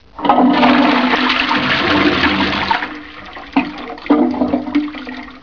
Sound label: flush; Toilet flushing